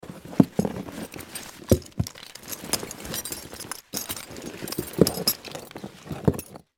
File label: Cardboard box with objects inside